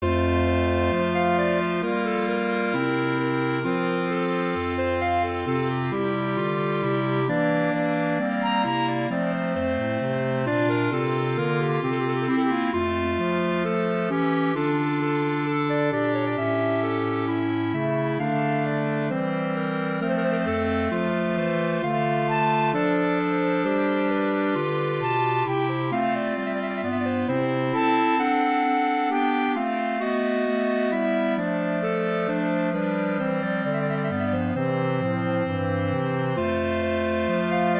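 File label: Cantabile Winds, clarinet quartet; Wedding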